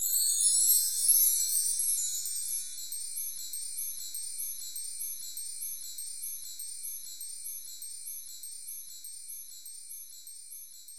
chimes

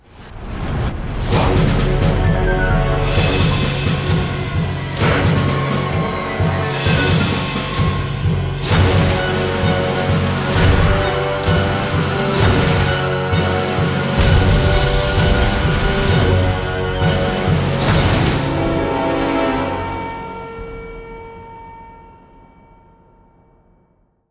Demon's Victory; Disciples III Renaissance

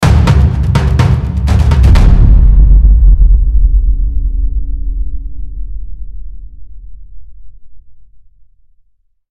Epic Drums Transition; UFS League

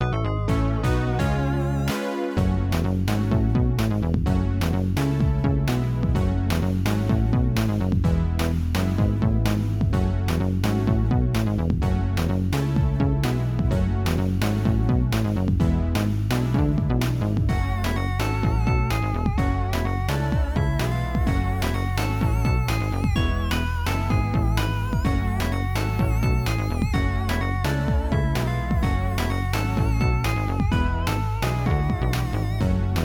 Music Screen Chapter Completed

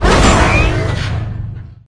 Vehicles

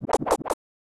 Death Blow

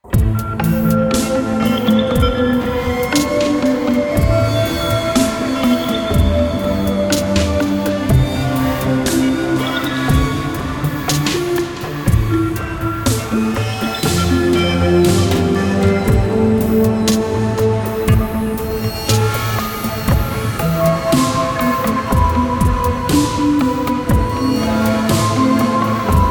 Slow long; Iron Soul

